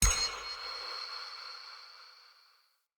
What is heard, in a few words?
Sword Recall Button Push; Weapons; Player; Swords; Fenn Jotar Sword